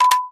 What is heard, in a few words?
recharged; video games; source engine; tf